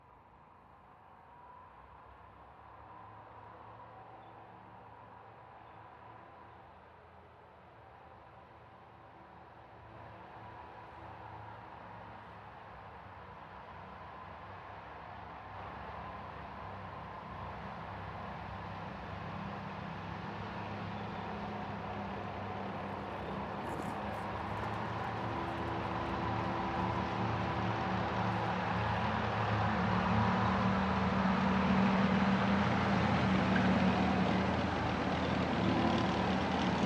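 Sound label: tank passes by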